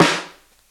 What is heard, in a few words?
wet snare sound E key, Royality free snare drum sample tuned to the E note